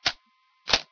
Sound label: cam click; There; ThereClient; therecam